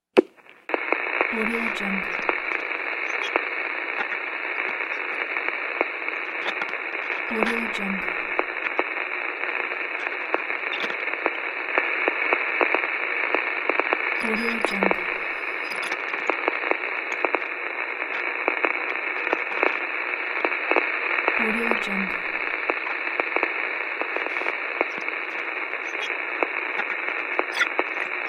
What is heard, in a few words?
radio noise